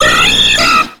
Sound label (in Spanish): Grito de Persian